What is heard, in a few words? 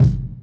kick